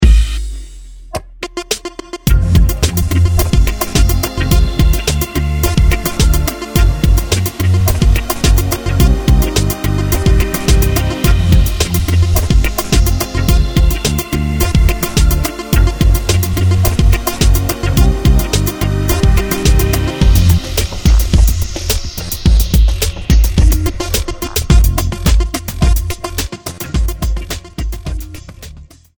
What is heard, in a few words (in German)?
Hintergrundmusik, werbung; Jamba; ghia productions; industriemusik; Produzent: Robert Gold; Regie: Stevan Treshow